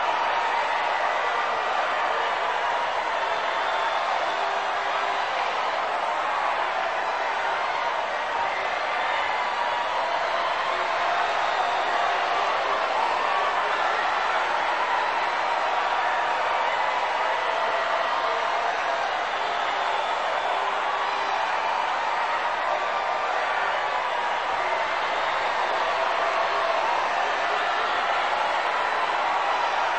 aplodismenti tolpi

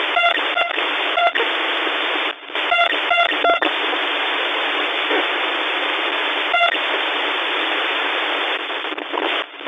pomehi rezkij blizkij

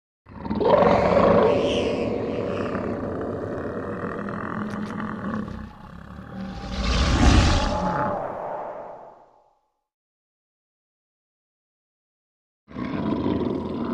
monstr izdaet zvuki

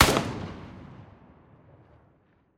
korotkij vistrel s dlitelnim ehom

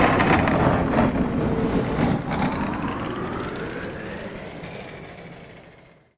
nizkij zvuk struyashijsya vverh

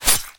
ostrij nozh shef povara

